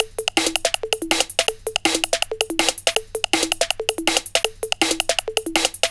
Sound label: 162 Tick Tock, Loops, breaks; m8 breaks